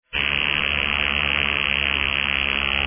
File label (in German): LeCoultre, armbandwecker